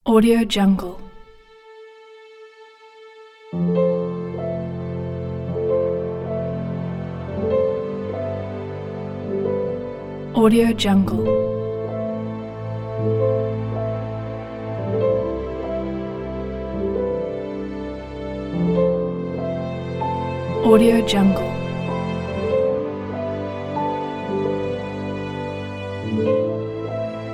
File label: emotional trailer; silverdolphinsounds